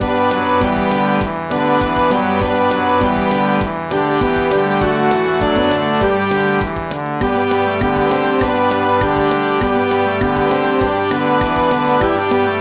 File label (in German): Streicher; Birthday Greetings